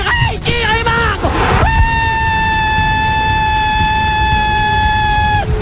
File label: aaaaaaaaaah e